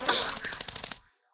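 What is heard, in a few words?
moth damage, monster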